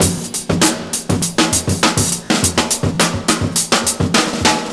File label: hiphop